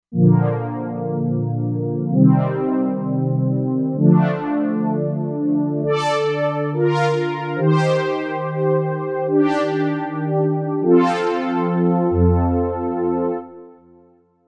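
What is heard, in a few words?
Brass Oberhorns, synth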